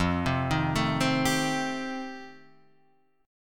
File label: F 9th F, chord